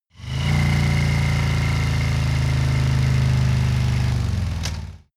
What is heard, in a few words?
motorcycle harley decelerate short